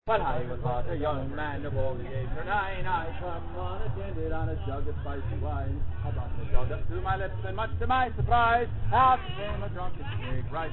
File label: snake song